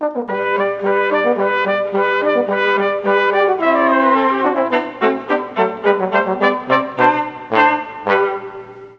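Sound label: Trp Tbn Vla, trumpet trombone viola; Juilliard Music Adventure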